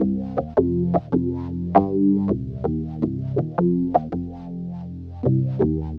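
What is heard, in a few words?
synth bass, drum and bass, 160 bpm, C; eJay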